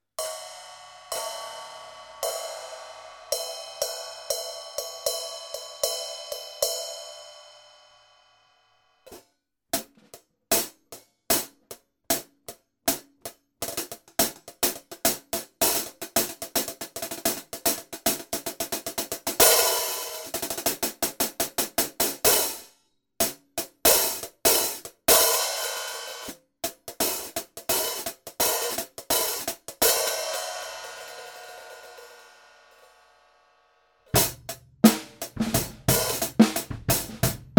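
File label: Hi-Hat Paiste 2002 13, cymbales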